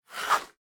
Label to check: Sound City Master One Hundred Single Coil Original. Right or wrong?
Wrong